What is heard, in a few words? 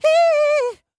hee, laugh; ooh ahh